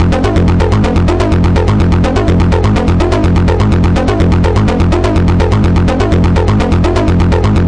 125 chase bass

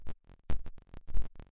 disturbing clicks; Weird and Interesting Hits; single hits

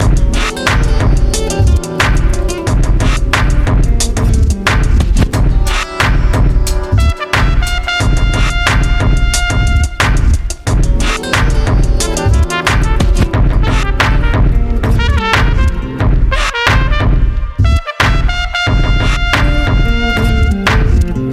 jazz

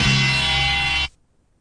orch hit

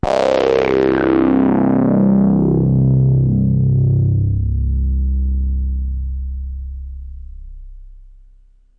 C1, Nasal Hard Synth

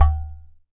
udu HIT, Udu Clay Hand Drum

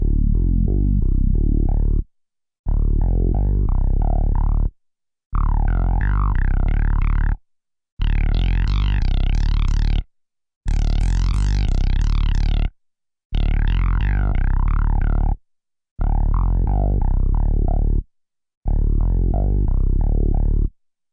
090 evolving bass synthline

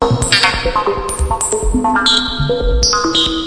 140 electronoize, drum loops and breaks

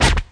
scratch zap; Scratching and Turntablism; DRUM LOOPS and BREAKS

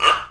guiro; Clangy Crunchy Foundsound Kit

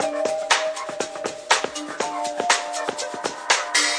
sentimentbeat; SAMPLED MUSIC LOOPS

